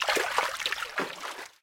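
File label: warm gentle sea wave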